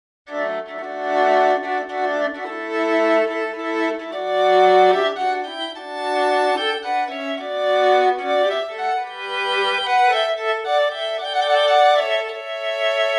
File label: Three Violins